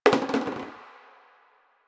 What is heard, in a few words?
Janitor Cart Plastic Bottle Drop; Warframe; Ambience; Nineteen Ninety Nine